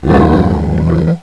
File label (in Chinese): 老虎; 动物; 兽类; 特酷声音素材库